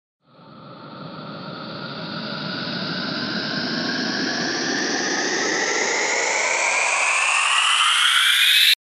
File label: RISER